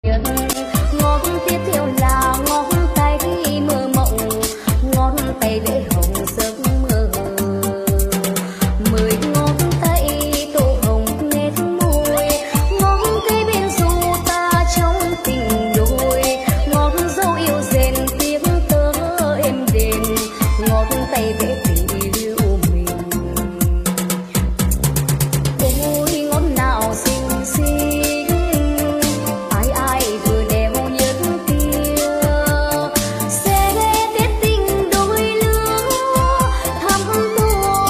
Liên Khúc Nhạc Bolero Trữ Tình

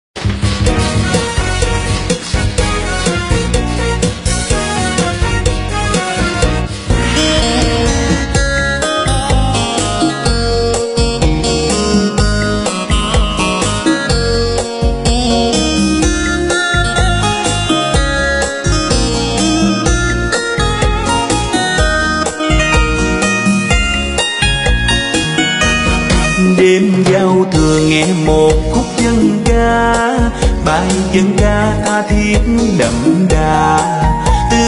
Liên Khúc Cha Cha Cha Nhạc Xuân Sôi Động; Nhạc Xuân 2021

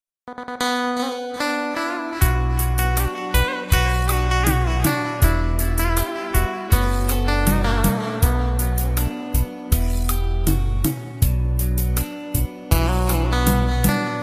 Hòa Tấu Guitar Không Lời Nhạc Guitar Du Dương

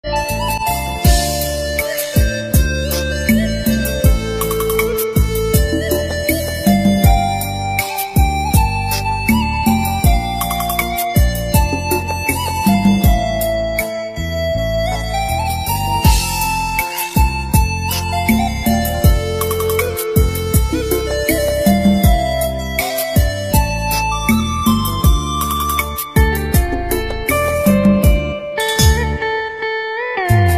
Nhạc Organ Trữ Tình Không Lời

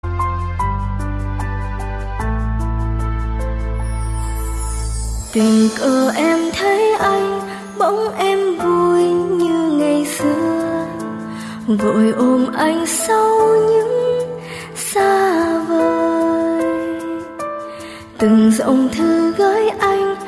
Tiếng Dương Cầm Trong Đêm

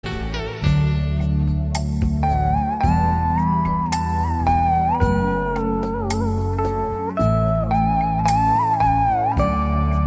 Nhạc Chuông

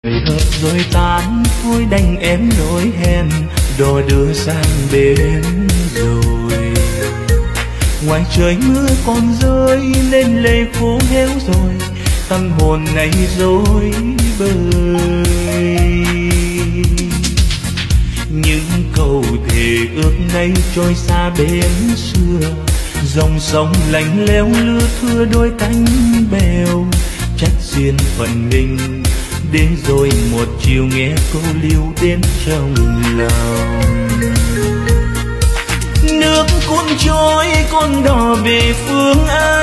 Liên Khúc Nhạc Chế Cha Cha Cha Bolero Nhạc Vàng

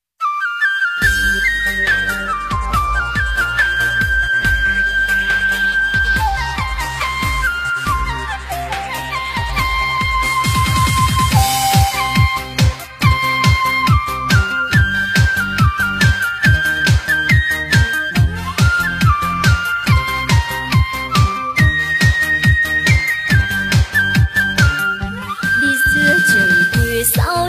Lk Nhạc Đỏ Remix Nhạc Cách Mạng; Bùng Cháy Cảm Xúc

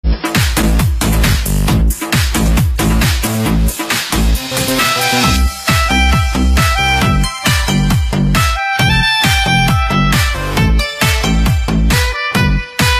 Hòa Tấu Saxophone Lk Nhạc Xuân Không Lời; Nhạc Chuông

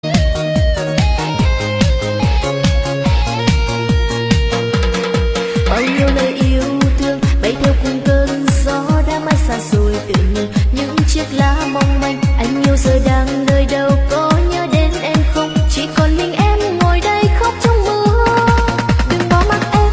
Liên Khúc Nhạc Sống Trẻ

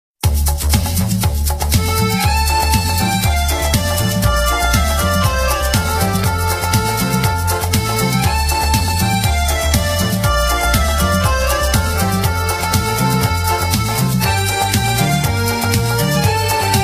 Liên Khúc Nhạc Trữ Tình

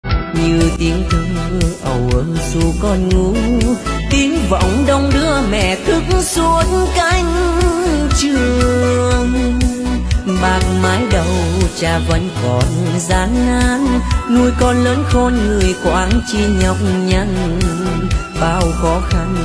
LK Cha Cha Cha Hải Ngoại Nhạc Vàng Trữ Tình Bolero

